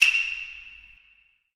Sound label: hover; altverse naruto modpack